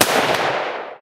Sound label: winchester, Guns Fire; Weapons